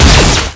flak cannon alt fire

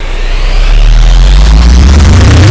LAND TREMOR

